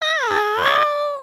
cringe; emotes

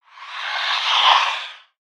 Ice Oneshot; Spinjitsu

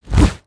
Owl Wingflap; creatures organic; Valiant Summoner

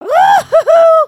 double wave, emotes

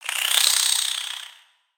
Spider Sleep; Lego; creatures mechanical

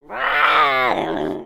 Pet Cat Angry; creatures pets; Lego

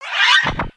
Beg, creatures pets

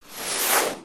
Jetpack Whoosh

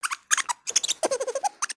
Pets Skunk Beg; creatures; Lego

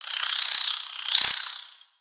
Spider Dazed; Lego; creatures mechanical

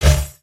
Click layer

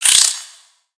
Spider Attack Melee; Lego; creatures mechanical

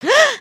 surprised

